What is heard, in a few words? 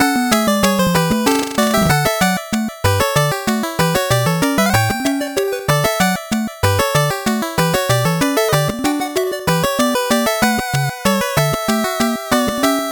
8bit bgm fast